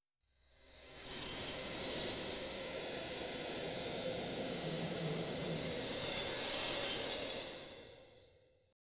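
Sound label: rushing wind like incoming; golden shimmer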